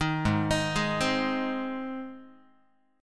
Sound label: Ab Major Ab down Guitar; arpeggiated; 6,4,6,6,4,x; JGuitar